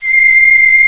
strings C6; Flashback

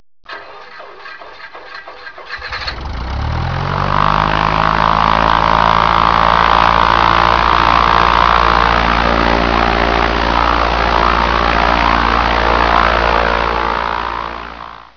planes; 8bit

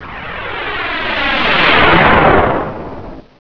RocktFly

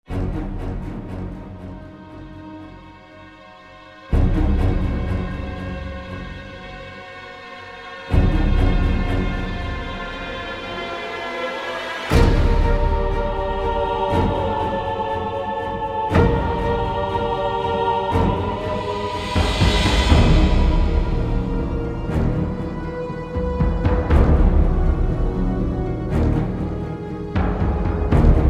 Conversation, Music; vampire demo

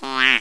QUACK

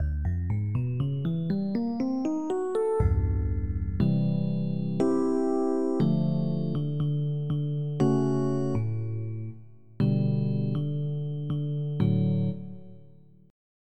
E.Piano; E.Piano 1; 2 channels

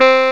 Tenor Sax (C3); X-Wing; X-Wing Patches

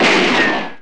pistol; cubic

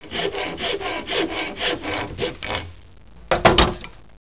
handsaw

